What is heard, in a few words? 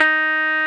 Roxichord, E.Piano